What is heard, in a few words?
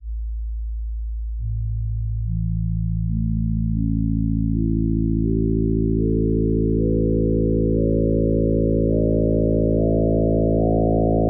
Harmonic Series Additive Sines